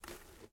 Glue Drop; Game rip; MiSide

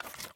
Firewood Take; MiSide

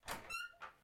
Open Door; Mita; MiSide

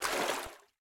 Step DeepWater; MiSide

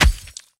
Creepy Hit; raw game audio files; Mita; MiSide

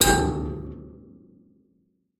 Tube Hit; Game rip; MiSide

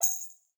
Table Toggle; MiSide

